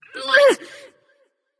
grunt lift light; smite; voicepack; hel

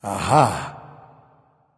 "ah", discover; smite; voicepack; ullr

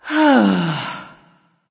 sigh; smite; nemesis

